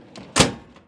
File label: connect Item THCK